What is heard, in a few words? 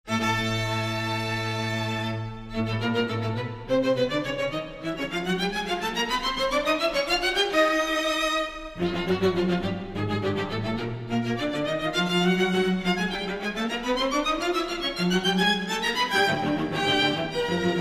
string quartet no. 4 mvt. i; rathaus